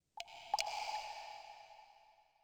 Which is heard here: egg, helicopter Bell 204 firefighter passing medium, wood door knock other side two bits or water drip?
water drip